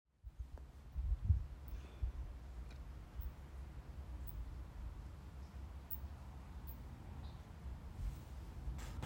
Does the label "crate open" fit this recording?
No